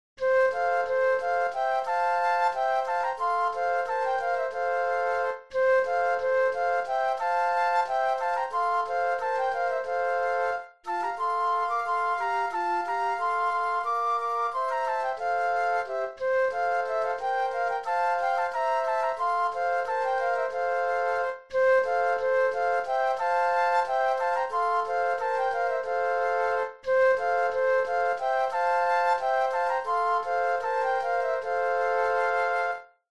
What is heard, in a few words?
flute trio; patriotic